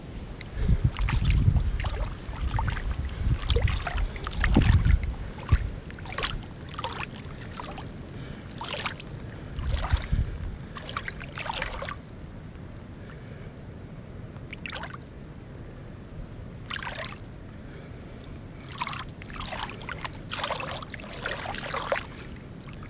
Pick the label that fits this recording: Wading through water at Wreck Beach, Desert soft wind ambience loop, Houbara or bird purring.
Wading through water at Wreck Beach